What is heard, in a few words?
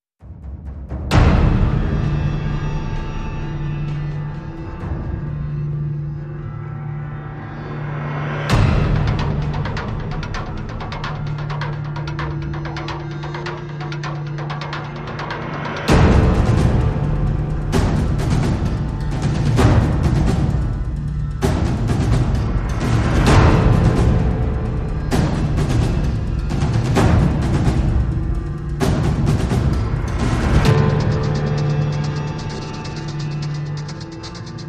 Perc and Pads